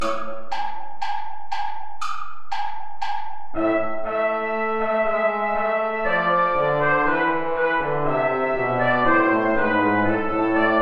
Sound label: play along without trumpet; hilton john; you lovers that have loves astray